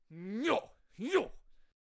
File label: Vlad Attack Vocals